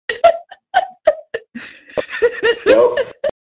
laugh

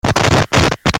static

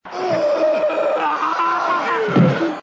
screaming in pain

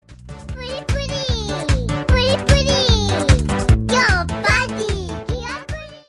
puddi song

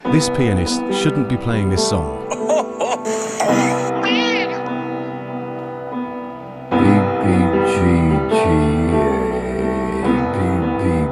piano

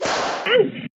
whack ouch

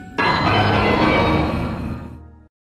windows xp exe shutdown sound

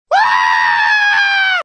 screaming

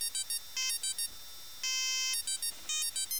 ringtone; teeker; gta iv

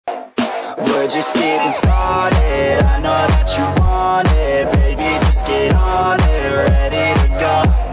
80s party

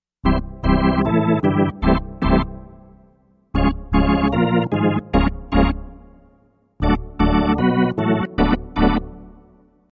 here we go organ

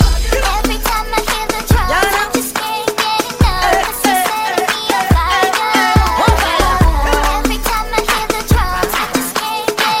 drums

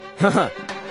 you haha

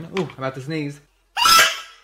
sneeze; jeffree star

